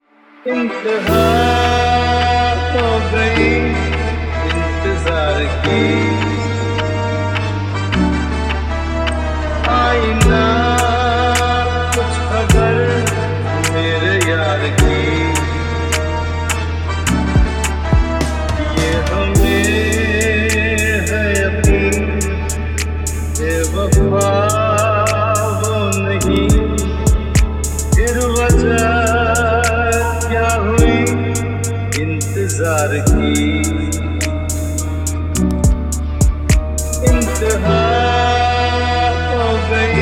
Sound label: lofi trap